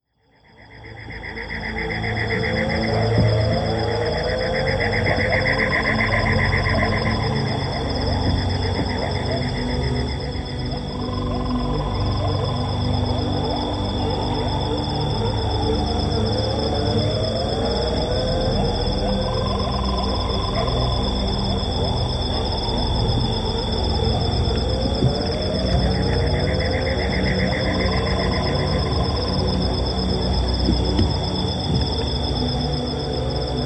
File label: Instrumental